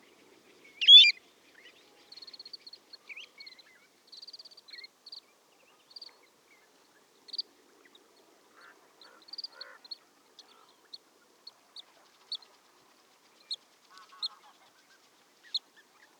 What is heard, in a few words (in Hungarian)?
ázsiai pettyeslile hangja